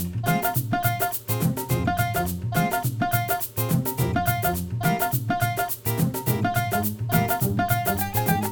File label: Music wet